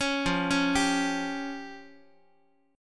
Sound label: F Suspended 2nd Sharp 5th F down Guitar; Fsus2#5 arpeggiated; JGuitar